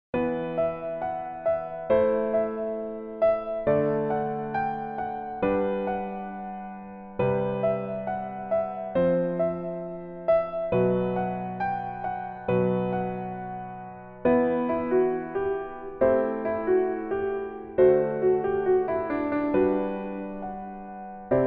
Piano ver 멜로디MR 남자키; 복면가왕

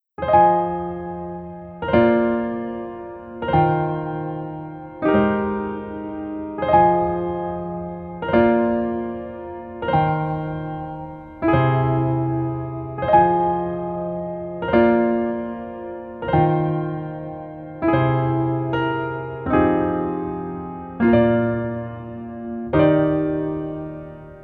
Piano ver, 여자키

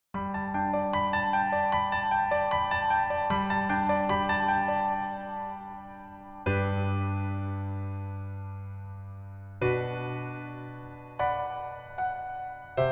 Piano ver 여자 키; 꼰대 인턴

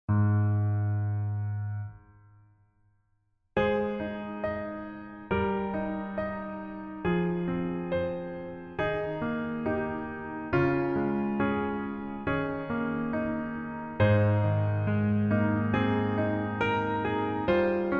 Piano 남자키

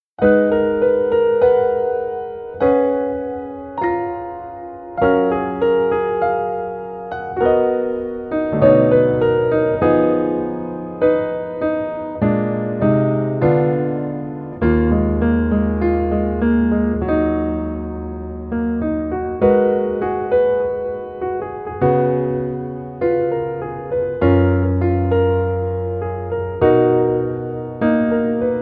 Piano ver 멜로디 MR -1 키

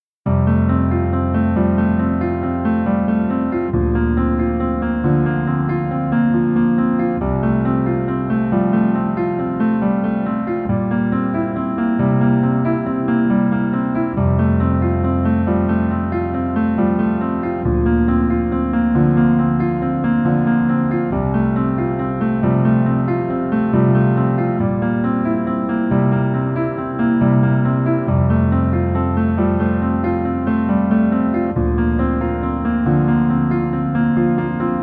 Piano ver 멜로디MR -1키; 이태원 클라쓰

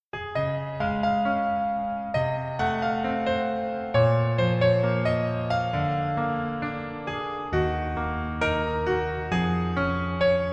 Piano ver 남자키; 그 남자의 기억법

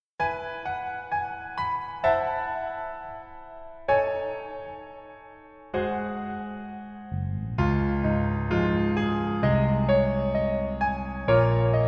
Piano ver 멜로디 MR

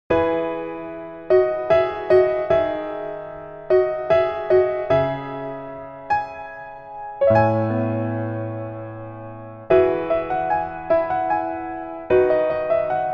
Piano ver, 멜로디MR, -1키

